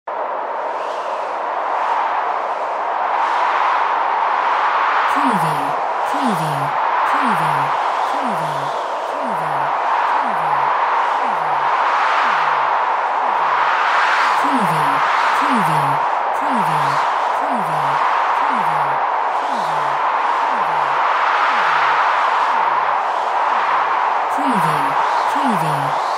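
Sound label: weather wind cold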